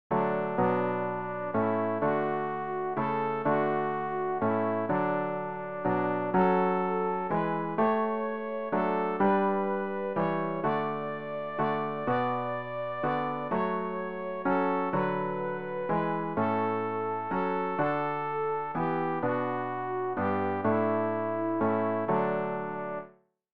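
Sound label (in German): sopran, Übehilfen; Ref. Gesangbuch; RG 057: Nun jauchzt dem Herren, alle Welt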